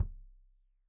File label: Kik Stereo; Deeply Sampled Drums